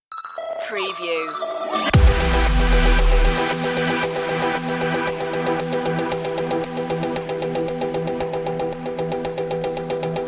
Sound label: cosmic funk stinger